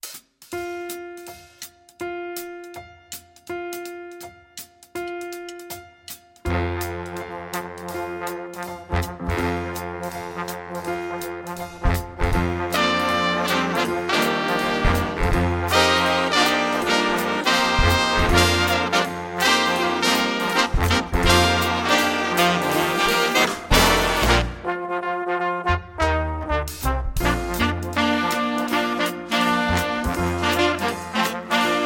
jingle bells

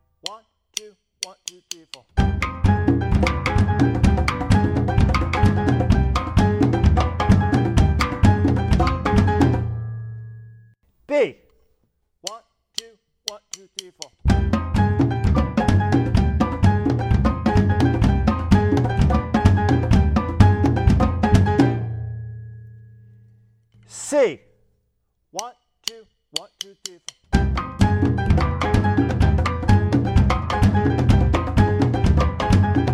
Sample Plena Rhythms

